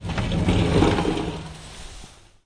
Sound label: slide open door; Sound FX Real Life